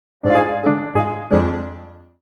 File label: Gravity Galaxy - Lose; Mini Mario & Friends: amiibo Challenge